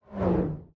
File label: Swoosh Transition